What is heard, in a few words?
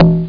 BONGO